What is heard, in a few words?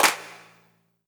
Claps & Snares